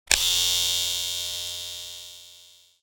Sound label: Power On; Tresor (Puzzle); silent hill downpour